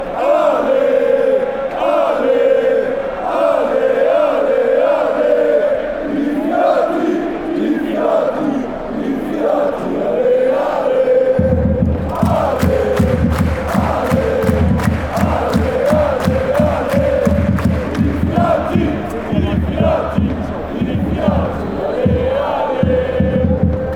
alez alez pauli, chants

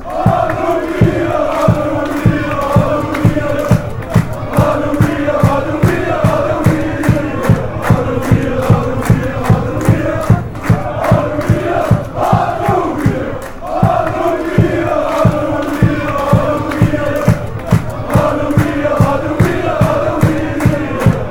chant; roda

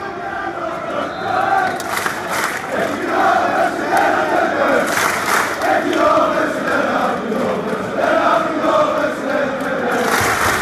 den haag chant

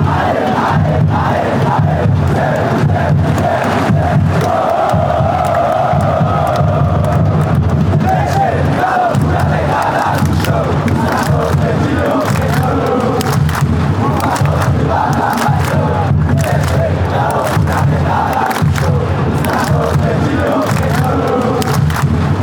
ae ae ae ae oh oh oh, fan chants; cruzeiro cruzeiro

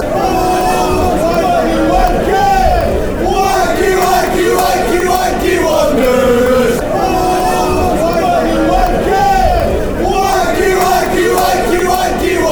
wnaky, fan chants; bfc

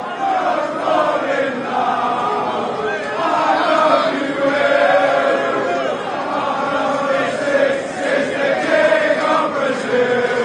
player chant, fan chants; gabriel; united kingdom

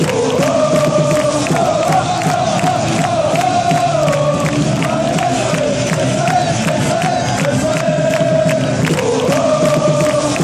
allez allez allez, fan chants; metz; messins

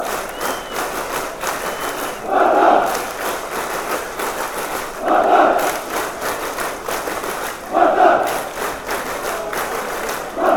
clap clap clap, chants; spartak; Простая кричалка на английском языке, исполняемая на каждом матче

